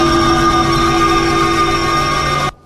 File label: Wailing stone